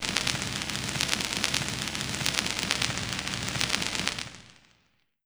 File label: Ensoniq ZR-76 Vinyl